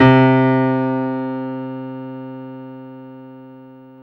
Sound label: PIA MICRO, MICRO-PIANO; Sound & Vision - Gigapack II